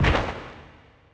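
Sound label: young maia step, dinosaur; maiasaur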